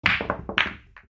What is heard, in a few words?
Pool break shot